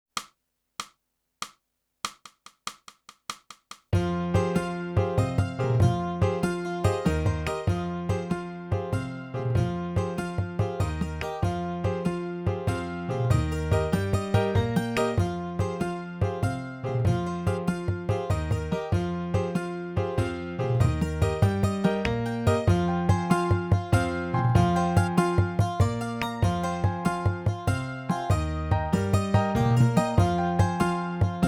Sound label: sax